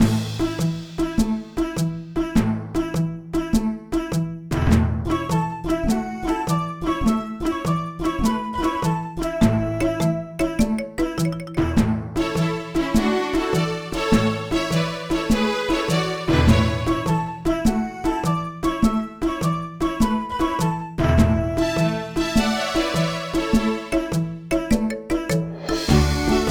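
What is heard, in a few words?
background music lobby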